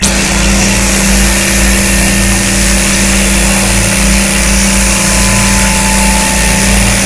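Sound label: laser beam green loop; Source Engine; Portal 2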